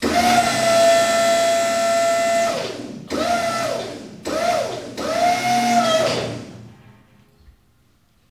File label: Forklift Elect Mvmt M